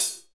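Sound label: HH, hi-hat; Sound & Vision - Gigapack I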